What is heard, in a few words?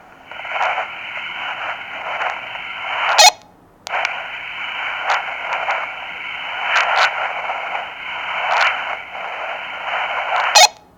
Walkie Talkie Rustling